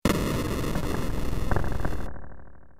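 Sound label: train starting; ff3us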